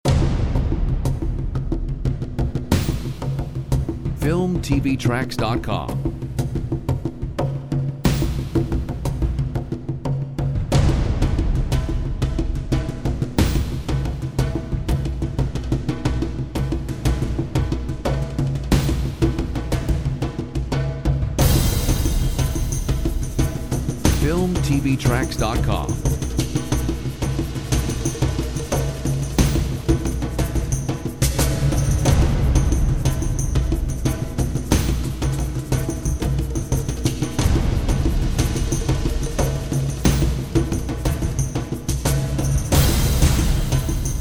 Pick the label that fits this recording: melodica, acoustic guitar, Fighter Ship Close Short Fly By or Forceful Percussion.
Forceful Percussion